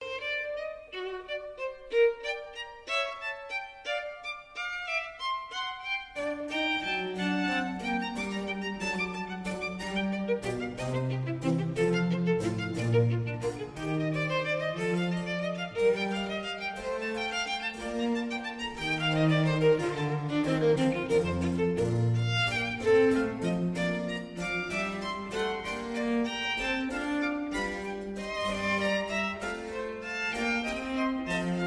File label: Violin Sonata in G Minor, Allegro; Vivaldi